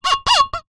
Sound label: drinking glass rub squeak creak finger; foley